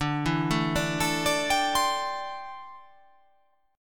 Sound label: D 7th Suspended 2nd Suspended 4th D